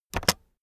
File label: keyboard external space press fast